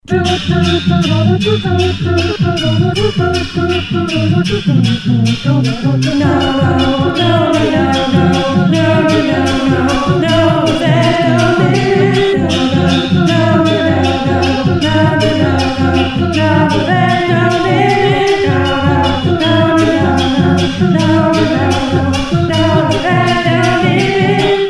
4 vocal tracks; playing with settings on new mixer

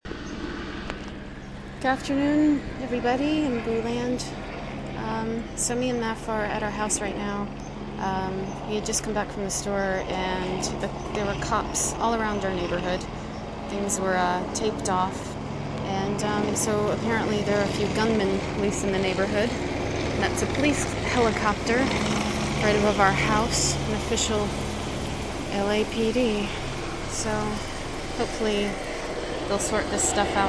police helicopter chase in our neighborhood; lapd

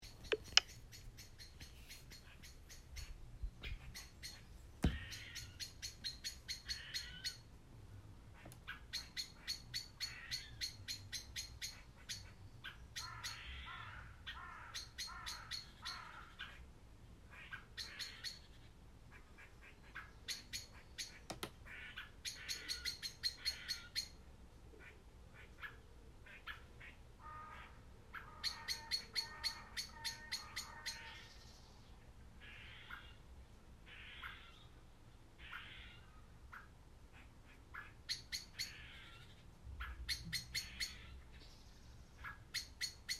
morning alarm calls

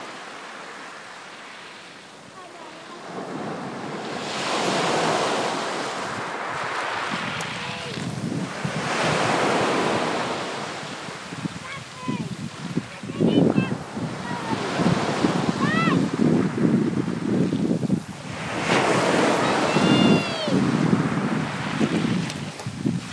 sea and yelling boy

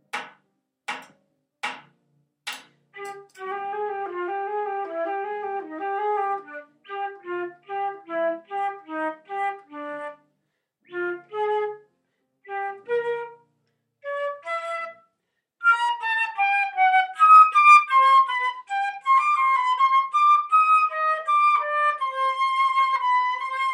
cadenza flute only

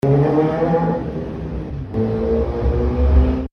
noise in new malden

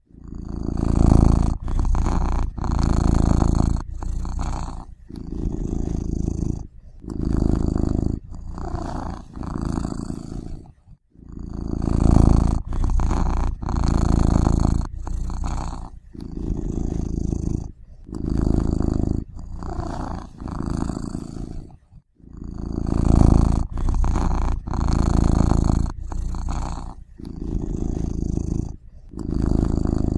loop of cat purring

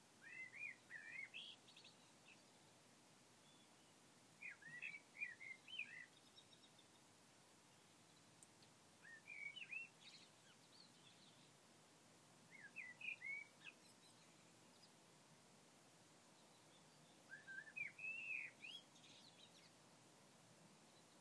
early morning birds outside my window

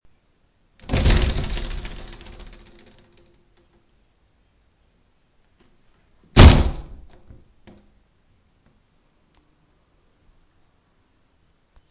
plastic door

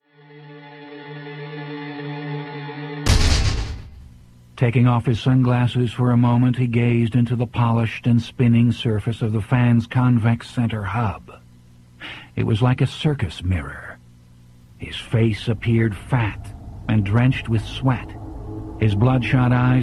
domestic terror suspense

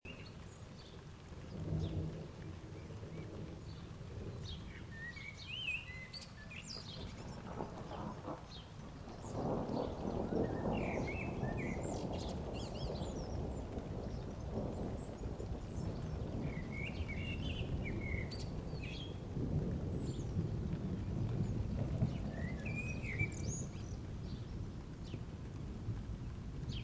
rain thunder and birdsong

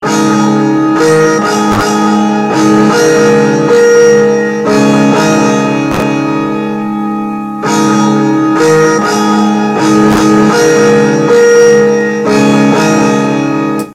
bells

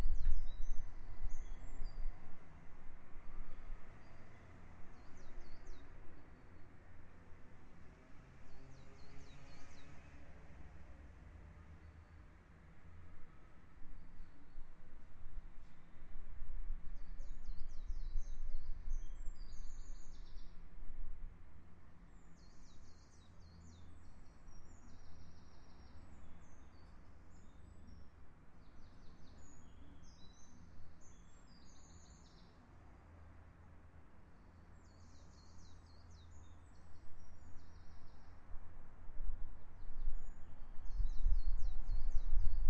lots of birdsong and some garden machinery

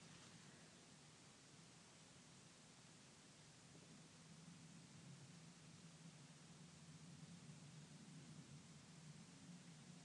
ambulance sirens now Gaza

